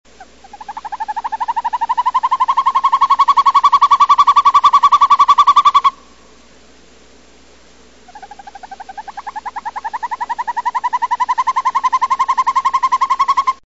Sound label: Northern Hawk Owl